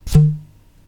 Pipe

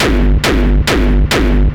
Hardcore Distortion Kick

